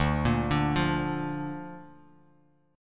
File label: D Minor Sharp 5th D down, Bass Standard; Dm#5 arpeggiated; JGuitar